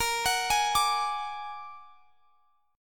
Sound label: Bb Augmented 7th Bb; Bb+7; arpeggiated; x,x,20,23,21,22